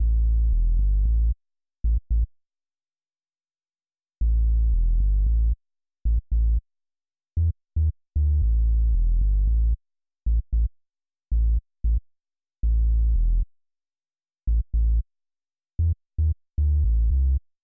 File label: bass added sub